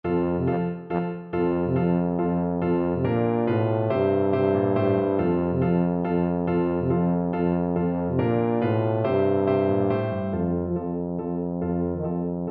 vivaldi autumn, tuba

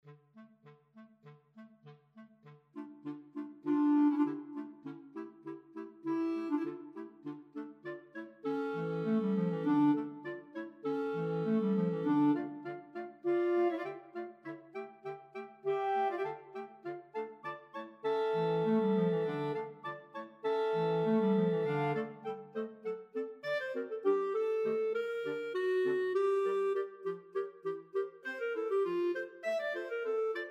clarinet choir

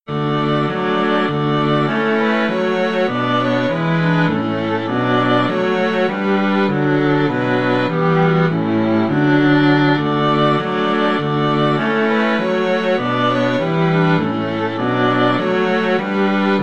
ein feste burg, string quartet

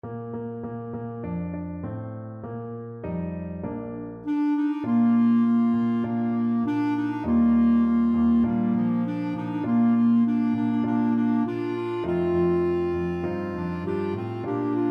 swing, clarinet trio

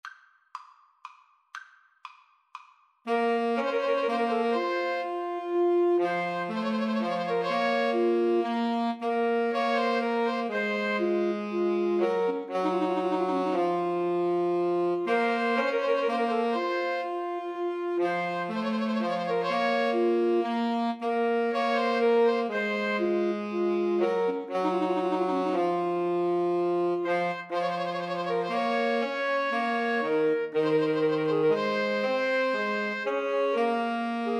pastorale, 2 Altos Tenor Sax

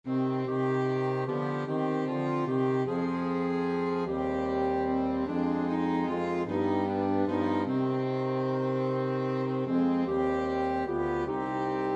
flexible mixed ensemble, 4 players

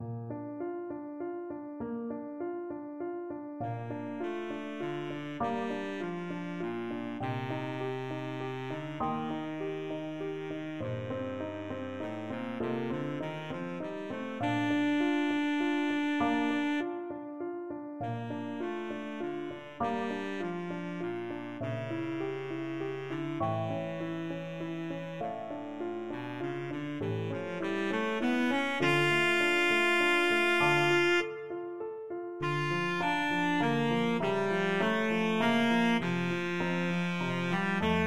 swan, baritone saxophone

